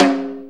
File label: Snare, Snare Drum; Drum Tools